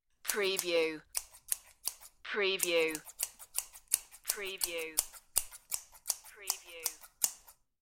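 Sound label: Quick Scissor Hair Cut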